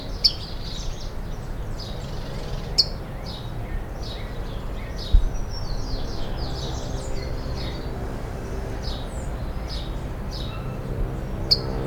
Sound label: Zonotrichia capensis 1 note